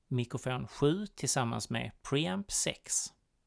mic pre

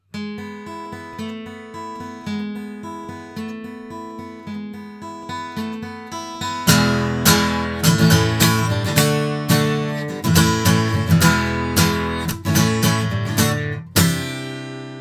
Yeti Pro guitar; BLUE

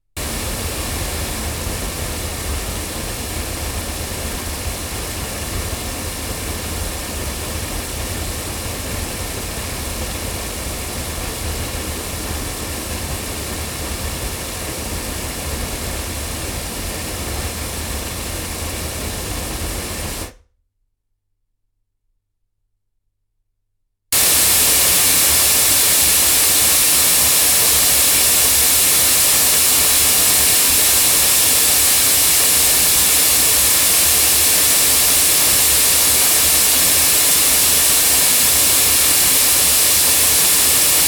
Generis GT2 Mk2 cardioid loudspeakers

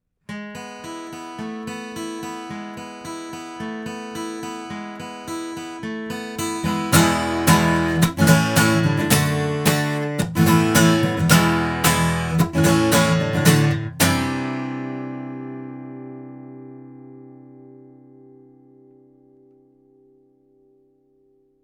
Neumann TLM170 omni guitar

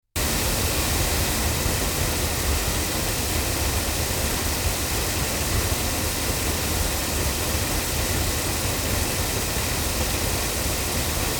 ADK A51TL hypercardioid loudspeakers

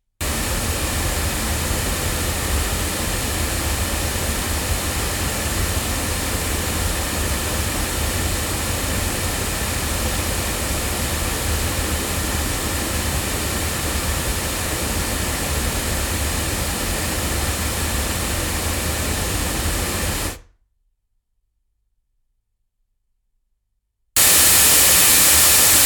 Sontronics Orpheus cardioid loudspeakers

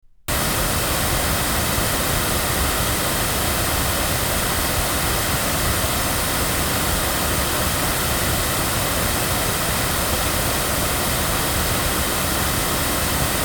loudspeakers; Lauten Clarion; microphones

